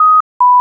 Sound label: Cuckoo